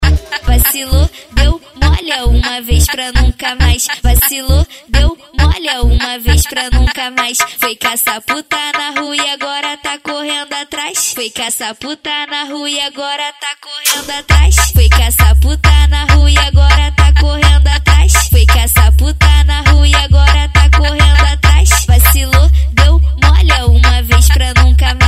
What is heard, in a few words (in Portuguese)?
funk ritmado; especial cuiaba